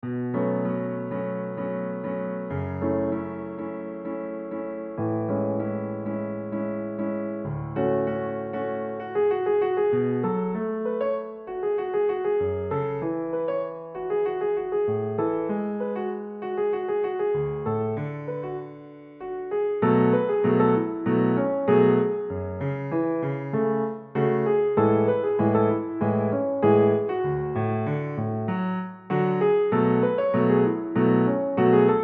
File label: solo, piano; Gang Leader